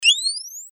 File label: Shield; Blackeye